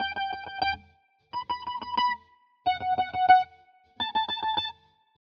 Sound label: Guitar loop 90bpm Em wet; guitar vibes